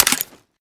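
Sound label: reload mag out, shotgun; reloads; iw8; romeo870; gmod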